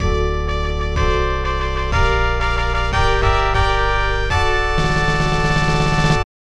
Section Complete; magic boy; snes

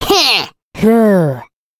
Classic Miss Sound; Jolly Battle; Android

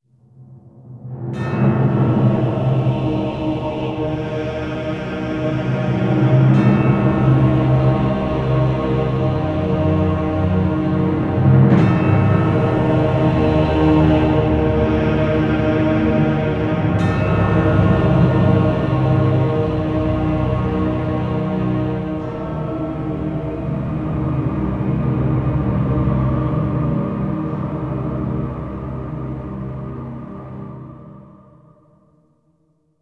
defeat human; Armies of Exigo

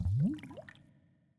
Bubble; the princess and the frog

